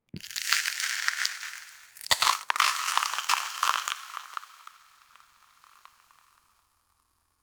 ice cream bar bite; magnum; sweet

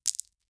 sticky slime explode

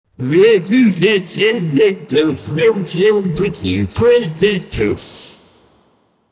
outro scene bold brand au, brand

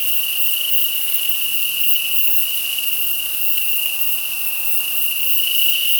jungle overview; mushrooms

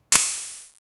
flash powder camera sound

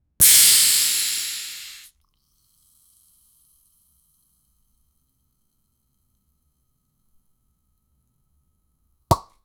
asmr, bubbles

